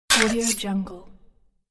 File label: mechanic quick metal slide